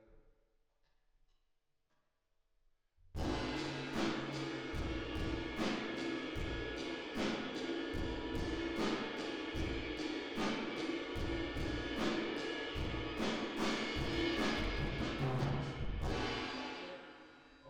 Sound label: Drums Verb